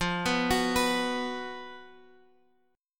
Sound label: B 5th F, chord